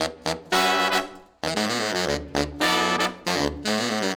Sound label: Horns Section